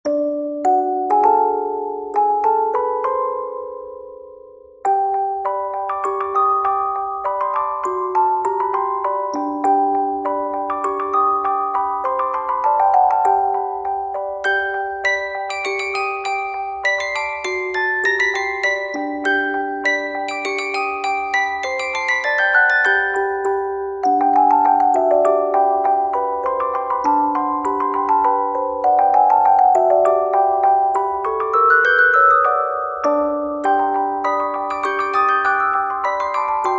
Music Box; Animal Crossing

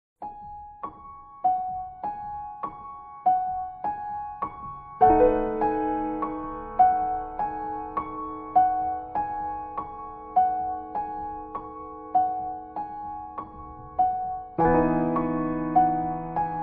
Piano cover; ANIMAL CROSSING： New Leaf

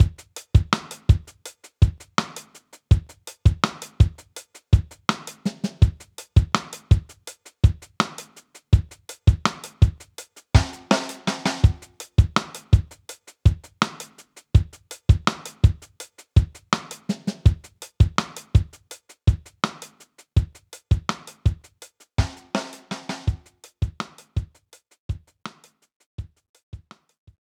BGM Course Race Lose; mario wonder

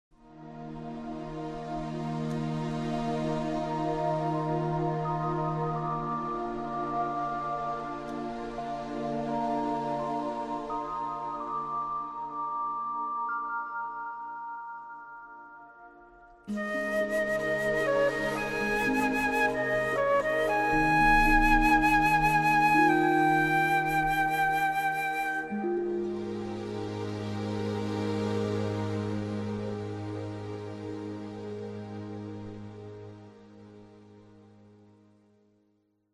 Orchestral Arrangement; Pokémon Mystery Dungeon 2

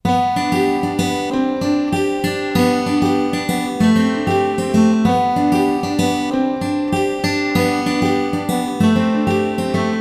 House Undertake Arpeggio; 3ds; Animal Crossing - Happy Home Designer

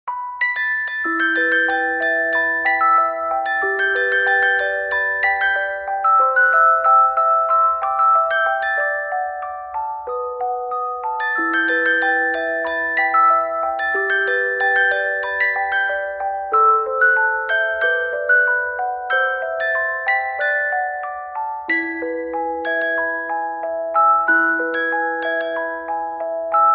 Music Box; Sega ‛＂Sonic Forces‛＂ Theme Song

